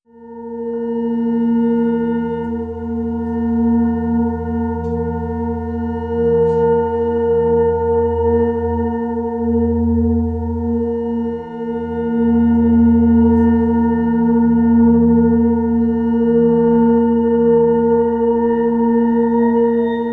Suspense, No Banjo

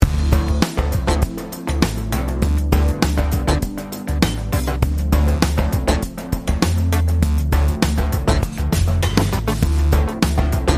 Funky Mood

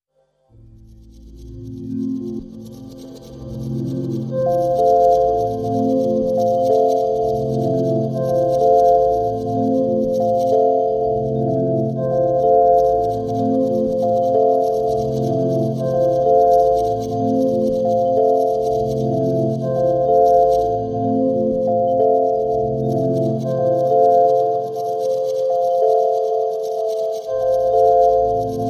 Ambient

